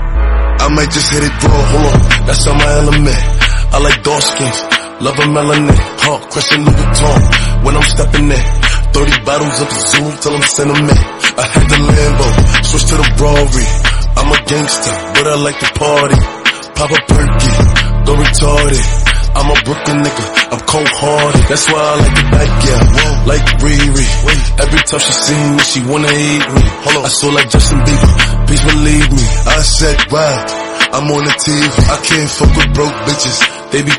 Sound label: song